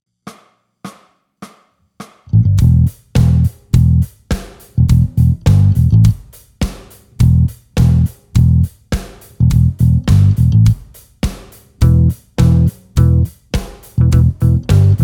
Main Riff

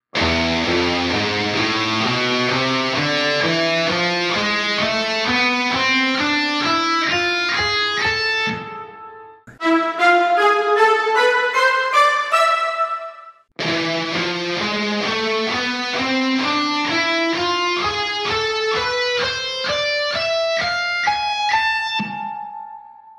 Phrygian Dominant Music; Domination

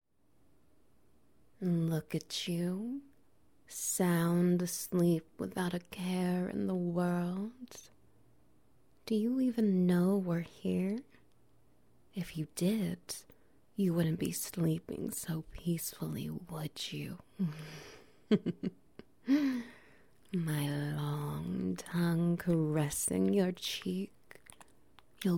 SFX Wet Noises

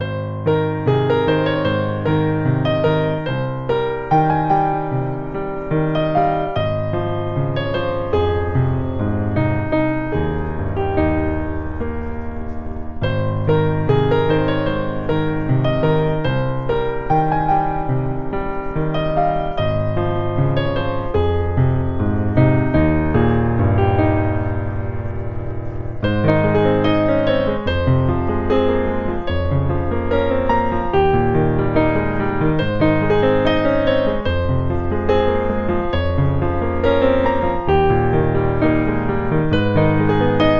11.5 Hz Alpha Waves, Classical; Ultimate Intelligence; Focus; Memory; Learning Skills

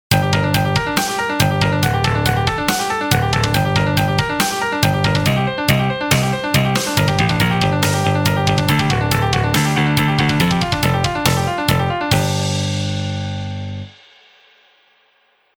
blazing neoclassical metal etude backing track

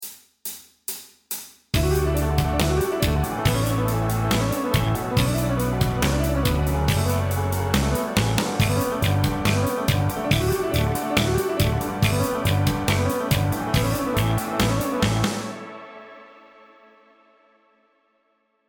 Sweep Picking Fast

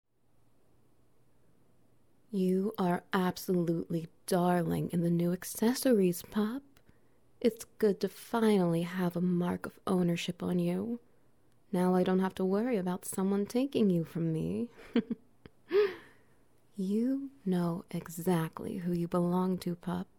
Masturbation Wet Noises

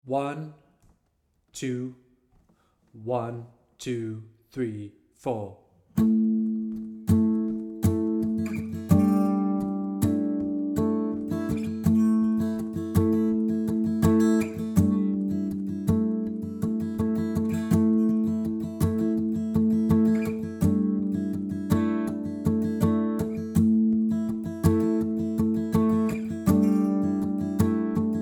Guitar Full Speed; Griffenfelt

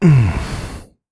sexual sigh

